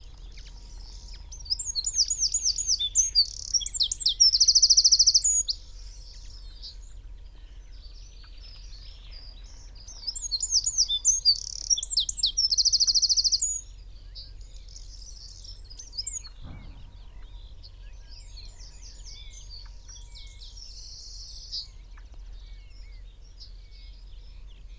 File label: Troglodytes troglodytes; SETE